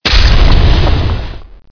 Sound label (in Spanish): congelación; cstrike; Teddy CSO